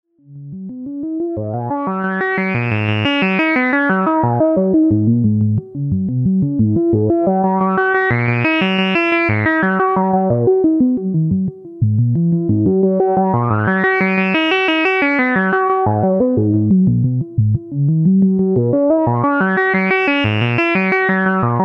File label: diode